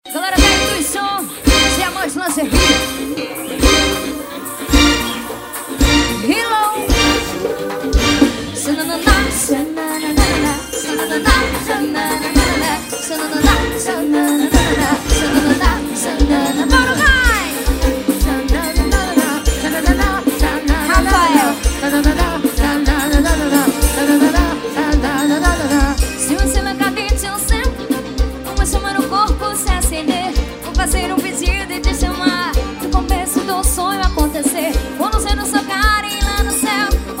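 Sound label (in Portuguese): ao vivo, forró; fuxiko rest bar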